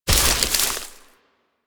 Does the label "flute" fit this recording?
No